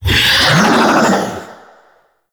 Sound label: Vocal Attack; Wraith Reaper